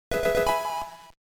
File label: level up fanfare; pokerogue